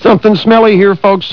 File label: fart